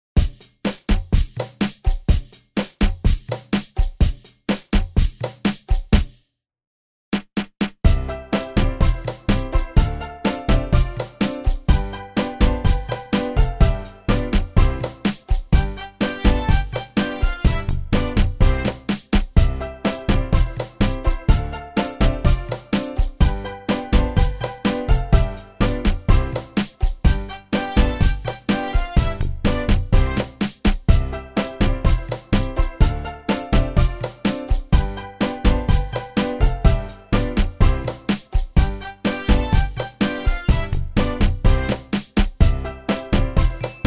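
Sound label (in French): Je te benis Seigneur (instrumental); chants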